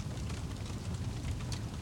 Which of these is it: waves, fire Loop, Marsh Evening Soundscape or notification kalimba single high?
fire Loop